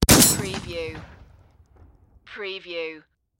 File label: Shotgun 12 Gauge Spas-12 Semi Automatic Single Shot Close Perspective